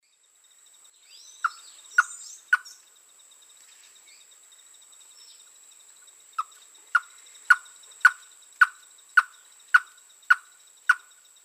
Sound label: Campylorhynchus turdinus, call; S. America; Eastern Lowlands